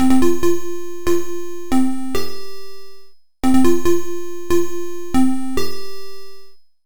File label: C64 Synth 140